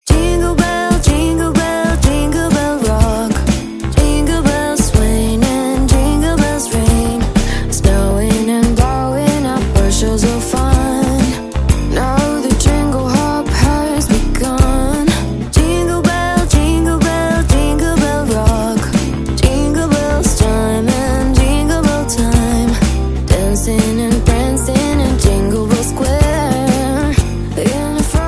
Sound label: Acustico